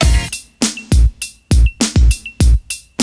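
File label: NICE BPM LOOP, BREAKBEATS; Crypton - DrumBase5000; BREAKBEATS#4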